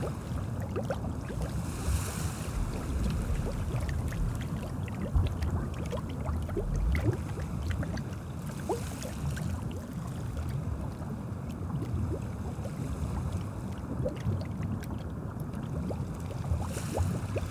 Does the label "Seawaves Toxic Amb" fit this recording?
Yes